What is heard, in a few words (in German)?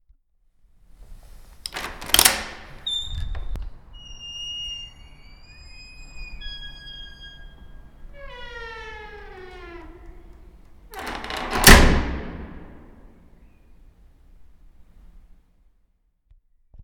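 Quietschende Tür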